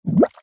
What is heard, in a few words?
cartoon bubble; zapsplat